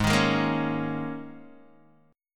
G sharp Suspended 2nd Suspended 4th, G sharp, down, Guitar, Open D